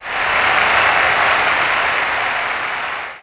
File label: crowd normal; Cartoon Network - Toon Cup 2020